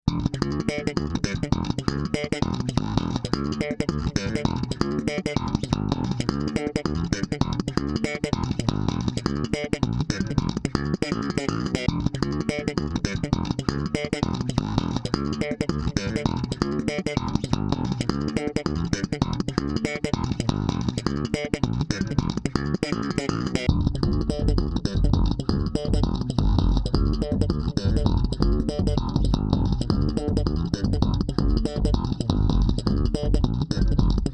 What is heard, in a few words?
Boss RC 1 Bass